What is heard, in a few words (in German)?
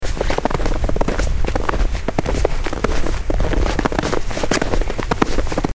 Schneelaufen